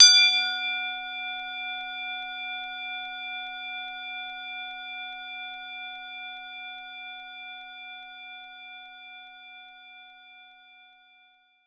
Tubular Bells D5; Knights Try

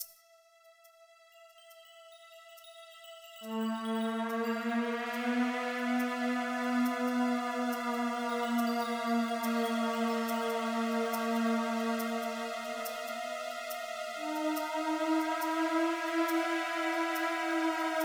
Bonus

